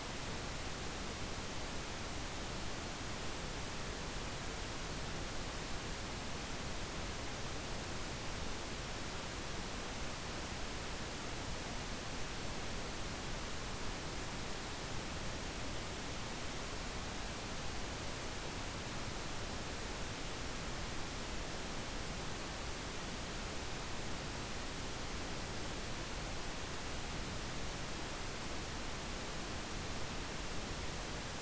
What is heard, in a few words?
pn full fr -20dBFS -23dBrms SBL 7 1